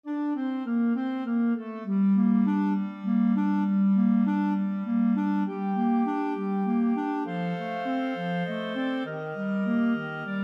clarinet choir

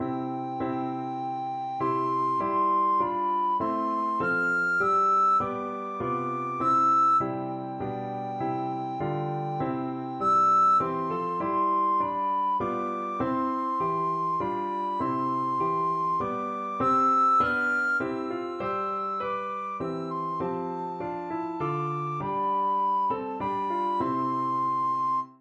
wiltshire, recorder

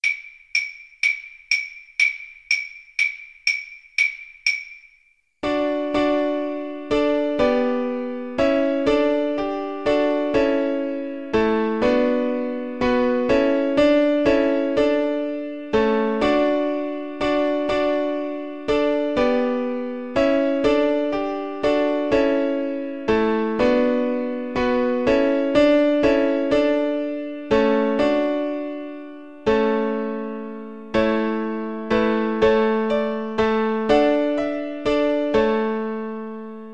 carol, Cello; sussex

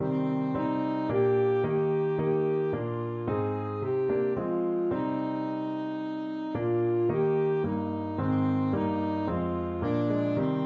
saxophone

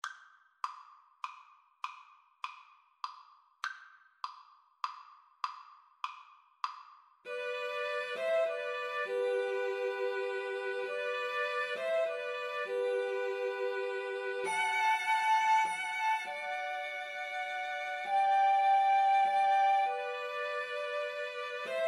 Piano Trio

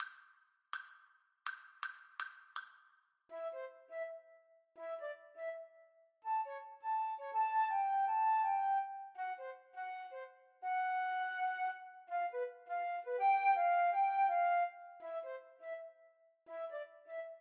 rag, Flute duet

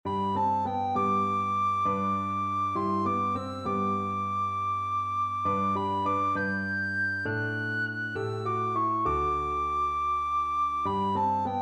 recorder

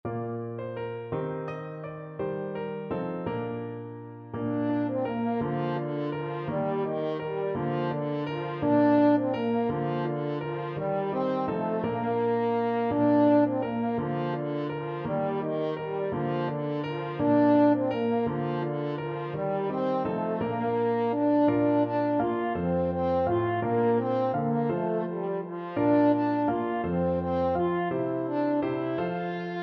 french horn

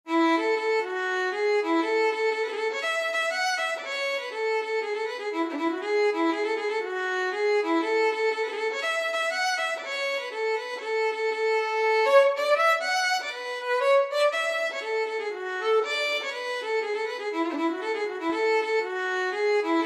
sailor and farmers daughter violin

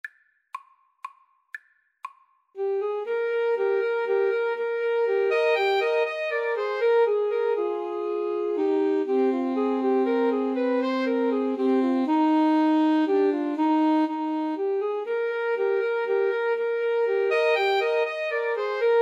haydn minuet, Alto Sax Trio

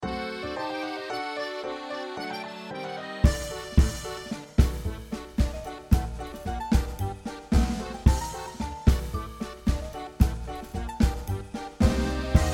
unsertoirele, Flute

